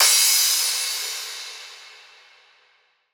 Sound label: Drop Crash